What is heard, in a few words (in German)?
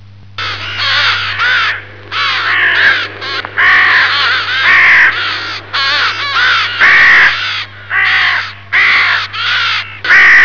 MOEWEN